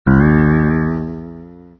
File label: spring